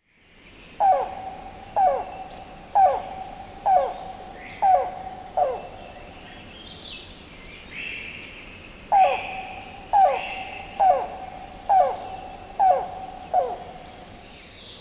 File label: Cuckoo Coo Call, Yellow Billed Cuckoo; Yellow Billed Cuckoo Vocalizations; YBCU